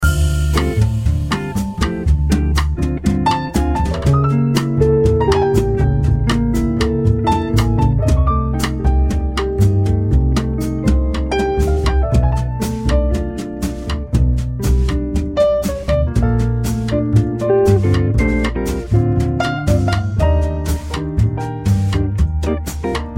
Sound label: Jazz ssa-nova